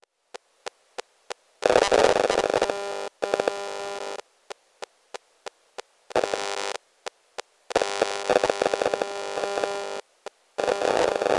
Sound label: Cell Phone 4G Data Stream